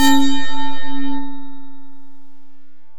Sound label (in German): Gender Trapez frei